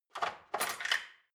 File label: dishwasher cutlery grab